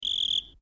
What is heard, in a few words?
cricket